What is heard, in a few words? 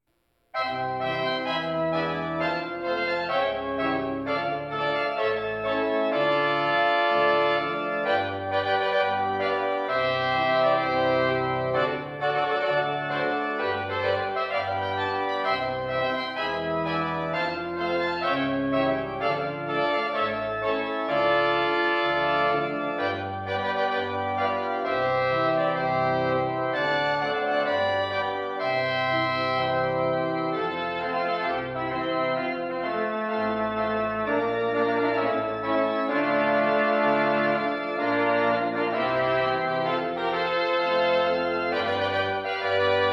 March Svenska